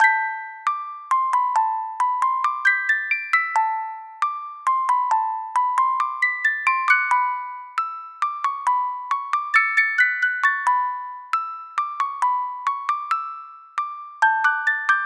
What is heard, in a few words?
D&B (Music Box); animal crossing new leaf welcome aamiibo soundtrack